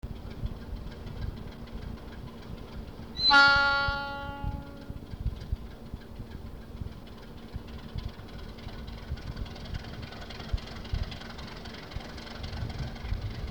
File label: Narrow gauge DL537 A-9108 leaving Kalonero on train 303; ALCO A-9108